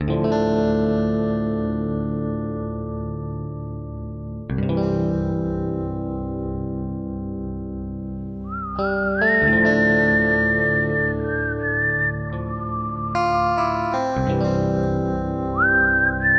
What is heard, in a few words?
Missouri Western, music